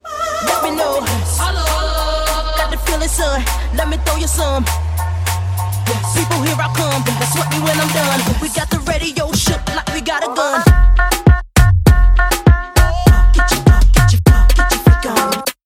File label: BEAT